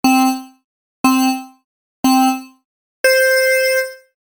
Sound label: countdown